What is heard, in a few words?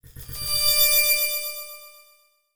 Title Transition Delay Short